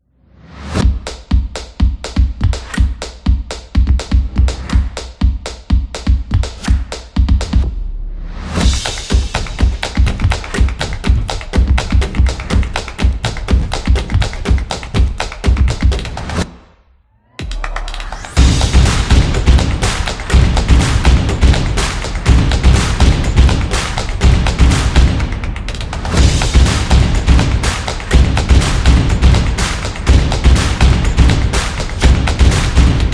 Drums Only; percussive nation vol 4

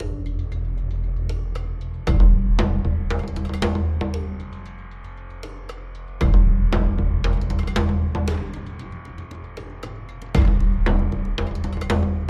Thriller Promo

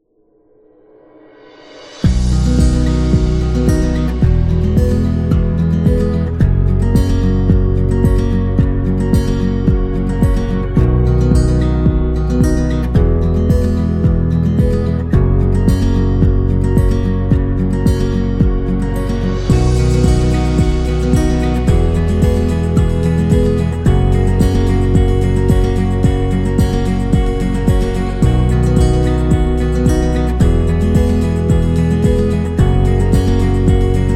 Acoustic Corporate, corporate music; corporate music vol 9

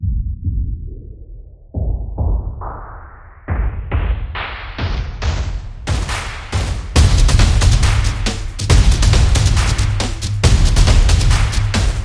Stomps

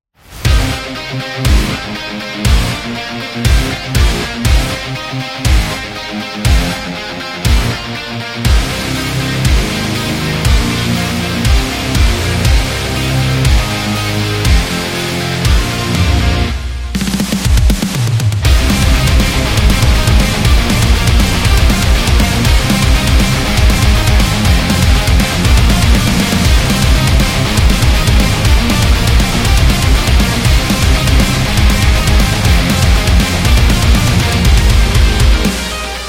At Heavy Metal

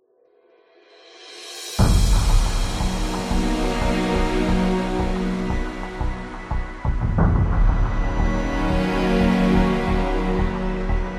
Time Lapse Ambient, epic music; epic music vol 3

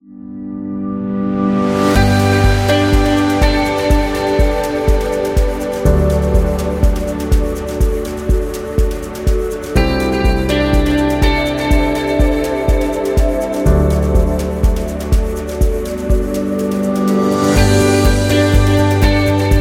Corporate Promo; corporate music vol 16

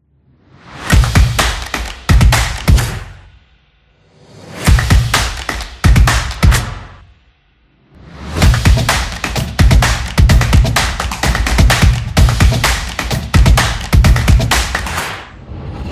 Drums Action Kinetic Typography

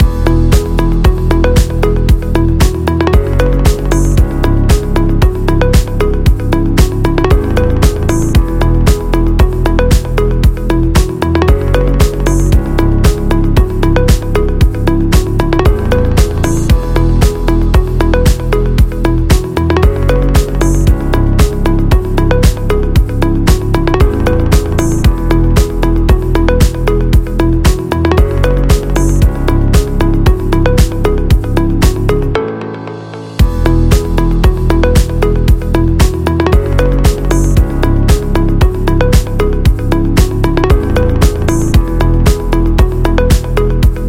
Minimal Loop Digital Corporate

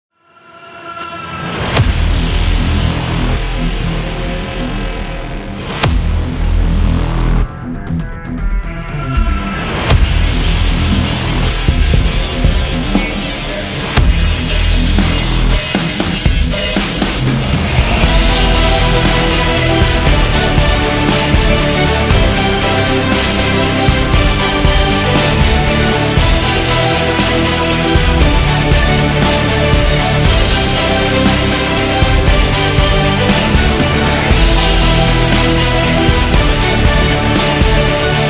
Cinematic Rock Trailer

